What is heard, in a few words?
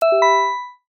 checkmark glass; buttons ui